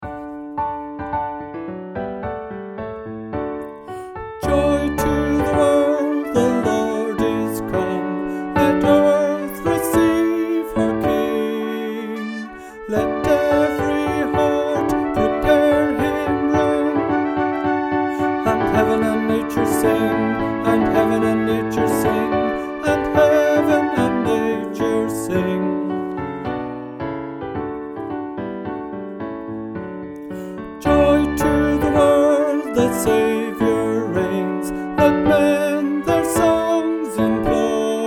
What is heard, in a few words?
Tenor; Joy To The World